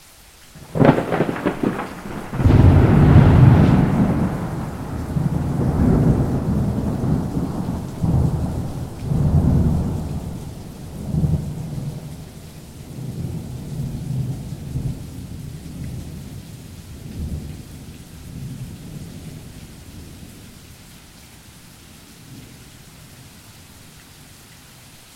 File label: thunder strike; Mike Koenig